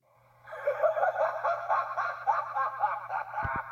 Schrei, ringtones; Zamasu

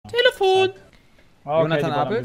Telefon

